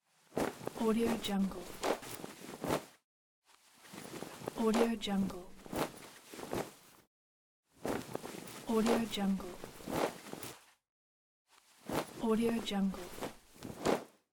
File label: cloth short undressing